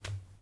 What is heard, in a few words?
hands on a wall; Apotheorasis